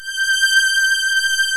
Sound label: ANALOG STRING; OMI - Universe of Sounds